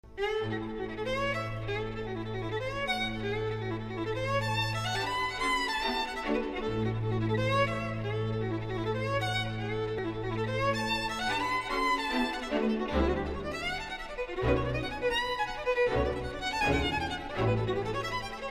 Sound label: Strings Swing